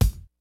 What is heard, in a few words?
FUNK, DRUMS; Kid Nepro; Elite